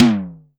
SIMMONS SD-5 drums

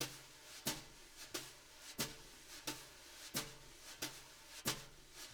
BRUSH LOOPS, Living Drums

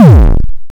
Star Instruments Synare PS-1

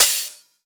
Open HH, Cymbals

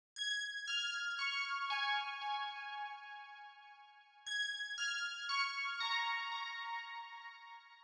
Bells, 117bpm; Zero-G; Nu Disco; Construction Kits; That Punch

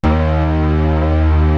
DEEP; BASS SOUNDS

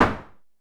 Drum X Snare; Pearl Drum-X

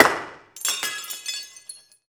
FX DELAY, INDUSTRIAL; Roland - LA Composer vol. 1

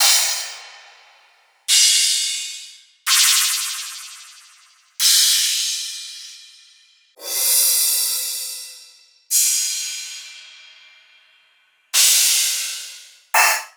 Crashes

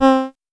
Casio VL1 ADSR; Solo-Synths; Giga Samples Collection

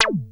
WASP; Masterbits; Vintage Voltage; SEQ-FX

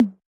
Tom, Yamaha DJX 2B

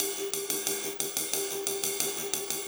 Cymbol Particle; Particles

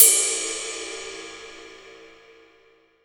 IST 22 TIP, IST RIDE

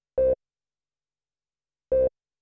PHONE FX, Techno 138 BPM; KOELN; Best Service ProSamples vol.54; AKAI

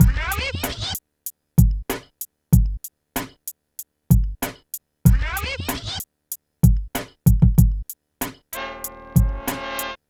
PHATT BEA, Hip Hop

